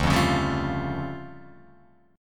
D Minor Major 11th down Guitar Open F; DmM11 strummed; JGuitar

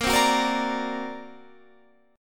D Augmented 7th Bb, guitar

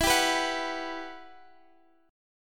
E Minor Add 9th; madd9:1,b3,(5),9

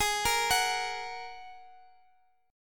G sharp 7th Suspended 2nd, Guitar, DADGAD; down; chord shape

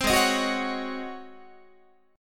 Ab 11th Ab Guitar; 15,16,18,16,17,17; Ab11 strummed

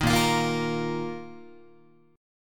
A sharp Suspended 4th Guitar; strummed; A#sus4; JGuitar

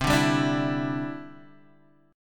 E Minor 7th Sharp 5th C

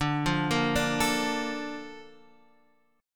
A sharp Major 7th

